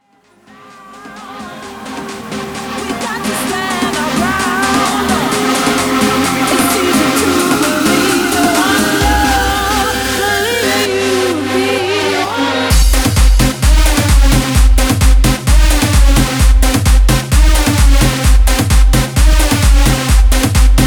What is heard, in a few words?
tribal remix 130 bpm